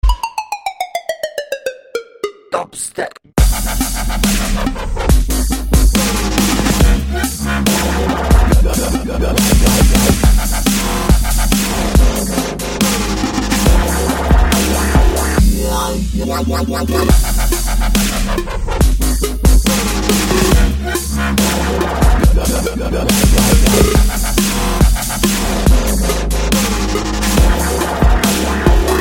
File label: Hard Dubstep Drop